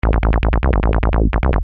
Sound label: Syn Bass 150 A#, Techno Synth Loops; SynBs; 150bpm; Sonic Foundry (Sony Creative Software); Signals I